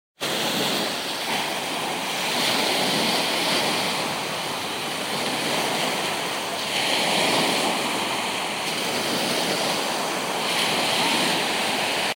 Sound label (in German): Meeresrauschen